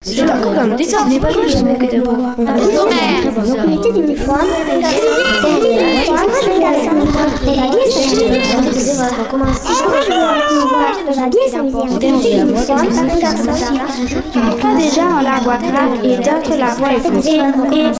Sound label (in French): cacophonie; MOBICLIC41; voix Intro